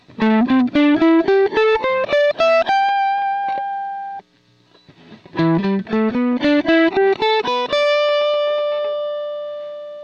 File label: minor pentatonic, guitar